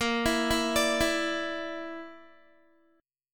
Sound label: A sharp Major Flat 5th, Guitar; A#Mb5; arpeggiated